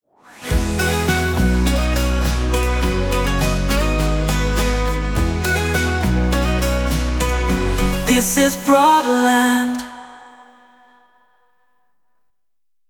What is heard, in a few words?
SUNG FVO BEATS, Hybrid AI Sung Jingles